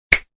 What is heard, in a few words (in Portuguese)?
estralo de dedo